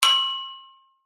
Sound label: impacts metal pole hit ping; zapsplat